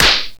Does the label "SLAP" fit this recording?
Yes